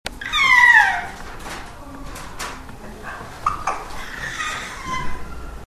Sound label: squeal